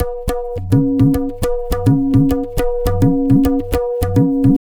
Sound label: Tabla, 105 BPM; Zero-G; Pure Tabla